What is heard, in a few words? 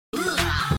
HOO UGH